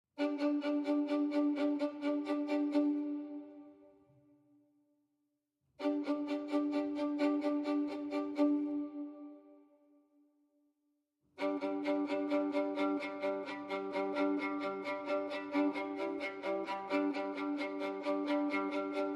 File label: Strings Bed Mix